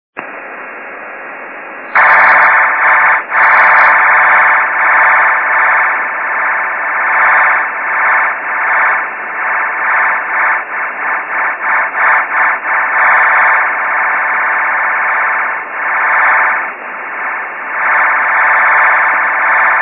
2m MS; OM3KFF

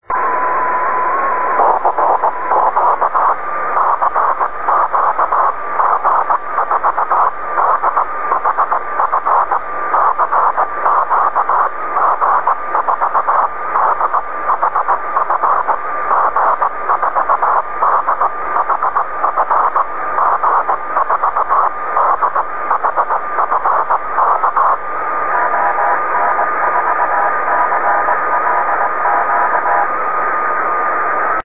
Aurora CW; G4DHF

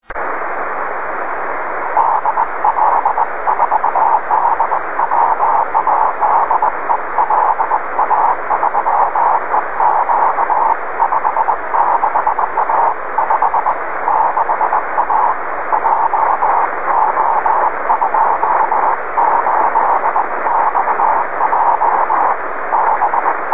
Aurora CW

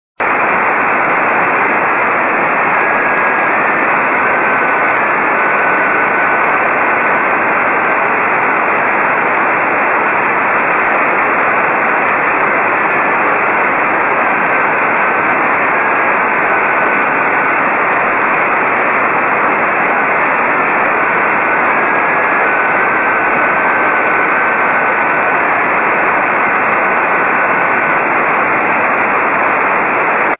2m EME; RZ4HF

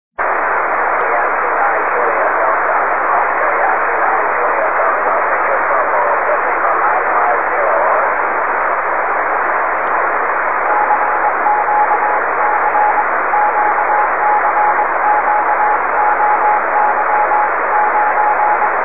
4m Tropo

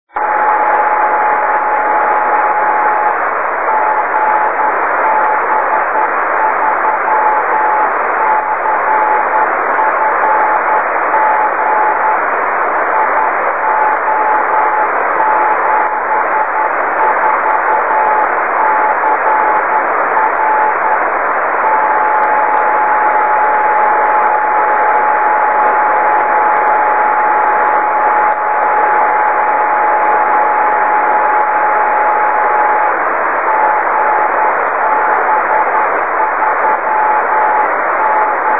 B 4m Tropo; GB3CFG

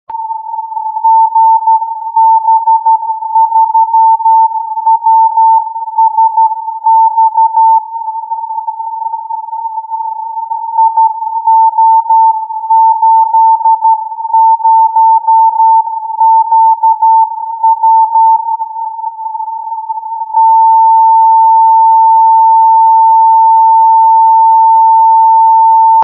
B 4m Tropo; GB3WSX

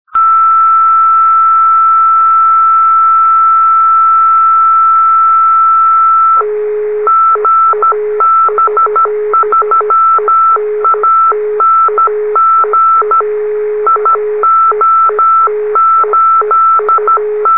B 4m Tropo; GB3ANG

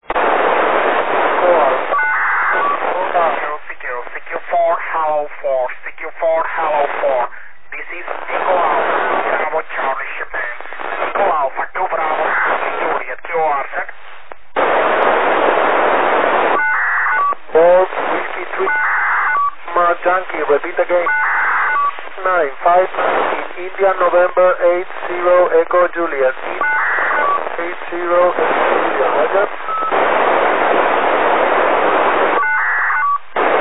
4m Es FM

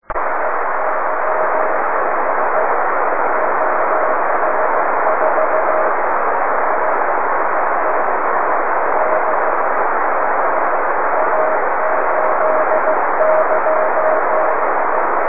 2m EME

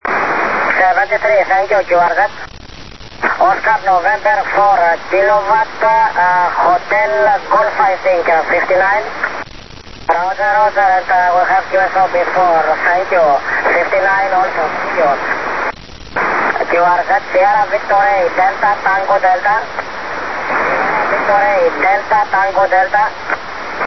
2m Es; SV8DTD

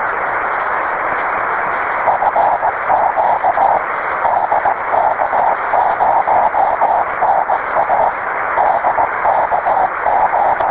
10G

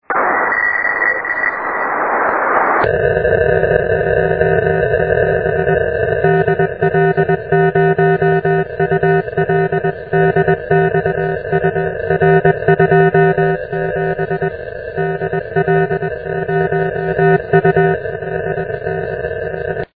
2m MS; EA3DXU; 1995

